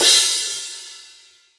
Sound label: old school long room reverb crash one shot f sharp key